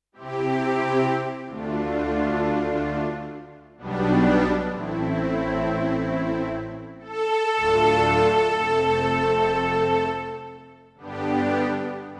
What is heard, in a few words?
Strings Choir